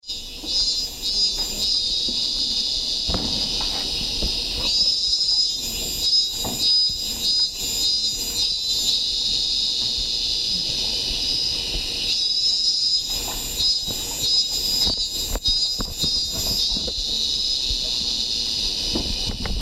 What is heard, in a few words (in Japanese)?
koe minnminnsemi; 2019semi